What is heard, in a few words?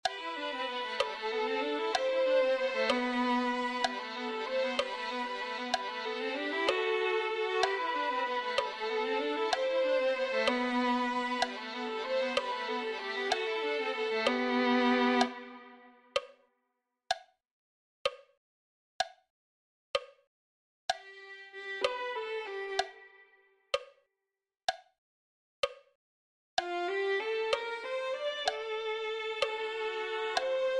Violin; On Vstal Z Mrtvych